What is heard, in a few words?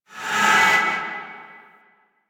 Void Charge, Weapons; Duviri; Incarnon Adapters